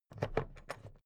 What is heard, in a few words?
plastic clasp open regular